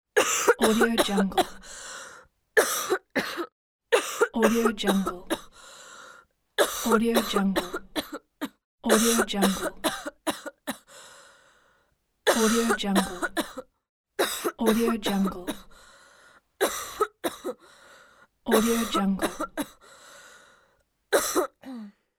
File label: female cough